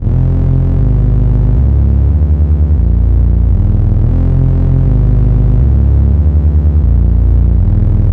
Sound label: drumnbase; polivoks